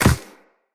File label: Walk Ice, Footsteps; Operator